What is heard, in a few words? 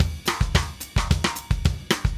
Drums, Jazz